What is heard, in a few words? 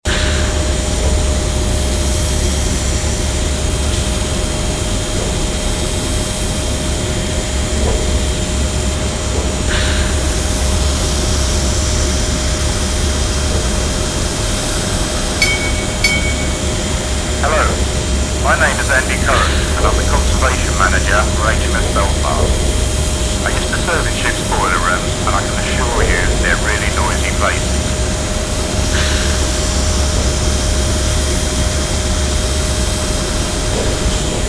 HMS Belfast engine room stereo mix